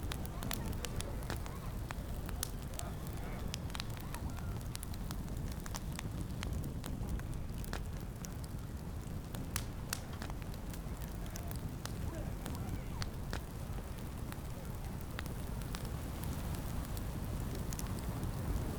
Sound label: outdoor ground campfire; anemoi